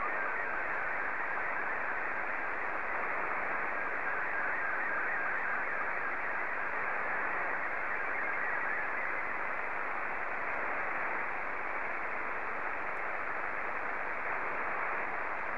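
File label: SSTV, Scottie 2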